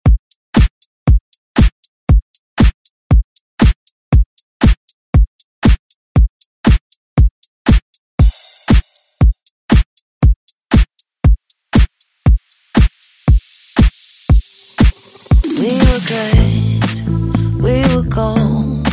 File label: Pop Redrum; Deejay Hit